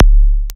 kik, one shots, dance; Sonic Foundry (Sony Creative Software) - Future Retro Dance Excursions

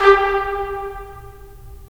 BRS TPS, Stacc; Roland - LA Composer vol. 4

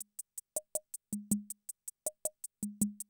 CR78, DrumLoops

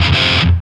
GTR

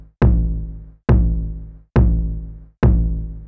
909 TOM, Techno 138 BPM; NEW BEAT ERA

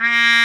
Mute Trpt; Club-50 - Foundations Roland; Trumpets

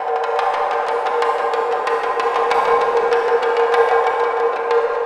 Acoustic HD, Percussion

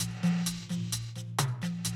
130, Classic DrumLoops

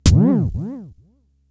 FX, Drum Machines; Fricke Schlagzwerg

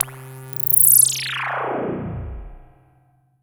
FX, EMS VCS3; Polyend; Simulacrum Sounds; 1 Shots; Synths

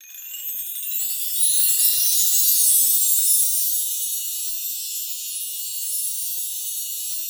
CHIMES; Xsample VOL-9

